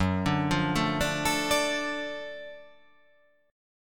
F sharp Minor Major 7th Sharp 5th, guitar; chordshape; down

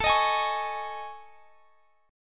A Major 7th Flat 5th, Guitar, Standard; AM7b5; x,x,19,20,21,21; strummed; capo=2

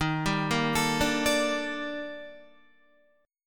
Eb Major Sharp 11th, down, Guitar; arpeggiated; chord

